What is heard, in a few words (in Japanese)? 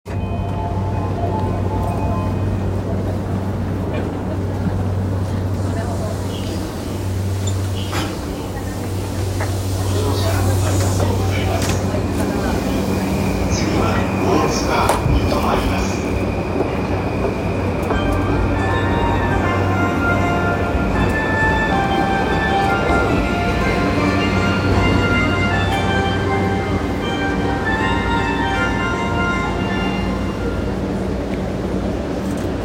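山手線 池袋 clockwise, train sounds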